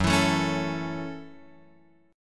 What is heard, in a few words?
Gb Minor 7th, Guitar Open F; strummed; JGuitar